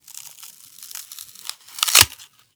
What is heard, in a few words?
Apple, Rip, Snap, Dry, Medium Long, Vegetable Carnage; Jeffrey Mengyan